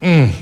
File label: mmm male; ooh ahh